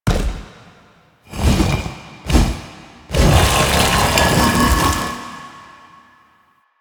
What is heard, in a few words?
Idle Noble Akimbo; Warframe; Warframes; Idles